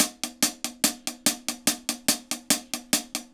HAT, HI HAT LOOPS, Dub; Drumdrops In Dub VOL-1

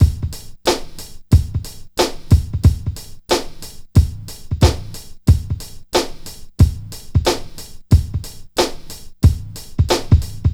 DRM, Hip Hop and R&B; Best Service ProSamples vol.02; AKAI

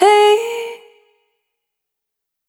Muon, Vocal Samples, Single Voice Melodic Loops; Singles

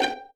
vipiz G4, Violins pizzicato

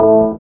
SYN DANCE; Sound & Vision - Gigapack II

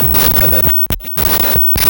drums

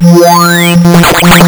lsdj waves; wavetables

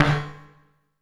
SYN FX, FX PERCUSSION; AKAI S6000 CD-ROM - Volume 5

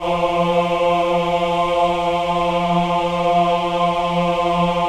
VIB F3, Symphony of Voices, VIBRATO; Spectrasonics

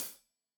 Hat Tight, Hi-Hat; Deeply Sampled Drums

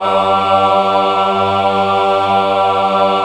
G2 MW AH, Choir; USB Soundscan vol.28; Acoustic & Synth; AKAI

